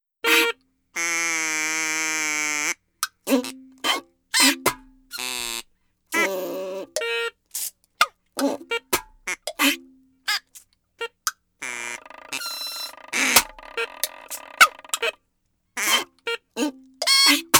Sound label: acoustic version